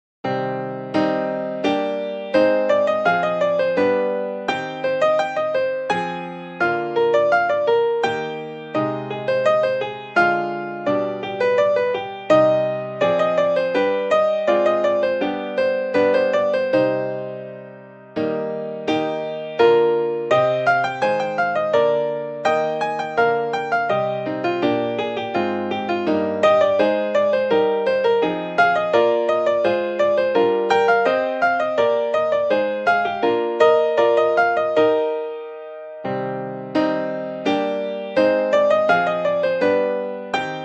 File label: Rondeau piano; purcell; This Rondeau is from the incidental music by Henry Purcell for a theatre work called "Abde